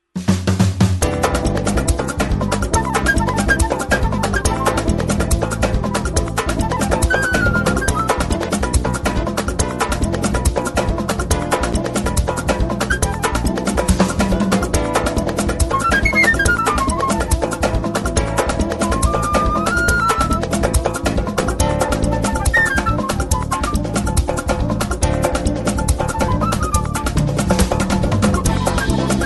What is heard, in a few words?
Samba